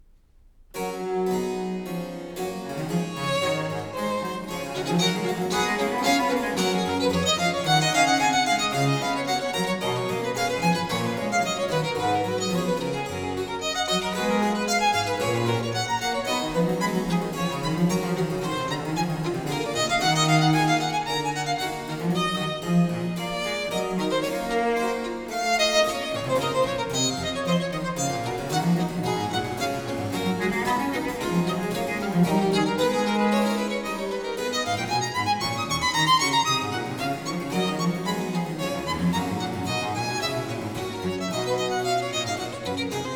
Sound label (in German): sonata fur violine und b c g dur presto; johann sebastian bach; bwv 1021